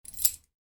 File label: Keys, Pick Up: Grab Keys With Slight Jingle; Miscellaneous Household